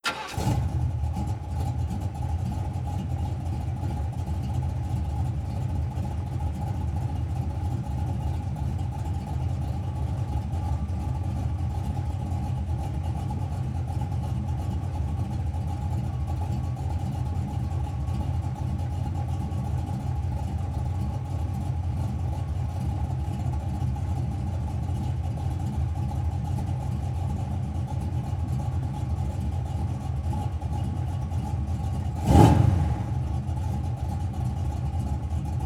Muscle Car Start Slow Revs Off; Vehicles

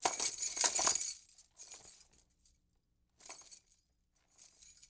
Keys Jingle; Vehicles; Doors & Keys

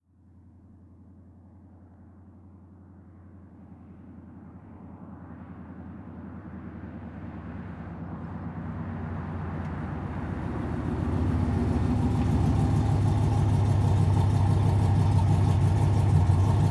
Muscle Car In Idle Off; Vehicles

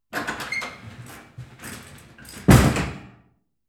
Back Door

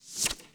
Whip Recoil; Weapons & Explosions

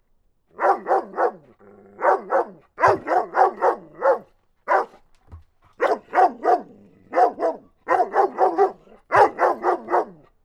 Dog Medium Barking

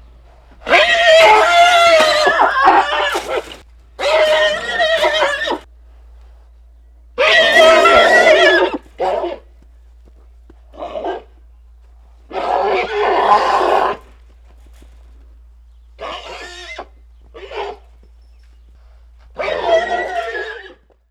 Horses Fighting Neighs

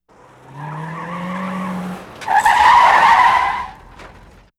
Skid And Stop; Vehicles

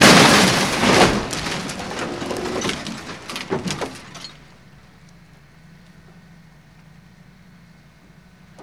Vehicle Impact Moderate, crashes; Vehicles

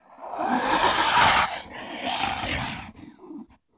Komodo Dragon Hiss

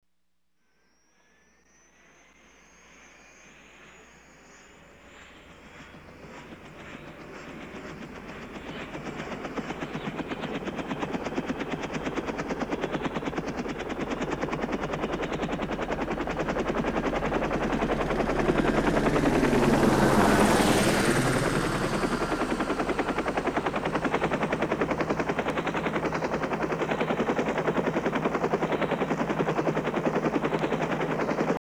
Helicopter Start Up

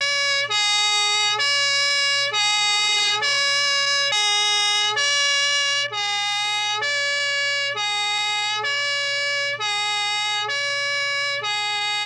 Police Siren UK Steady; Vehicles

